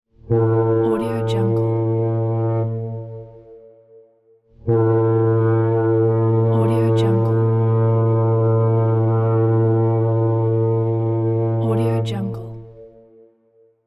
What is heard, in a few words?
ship horn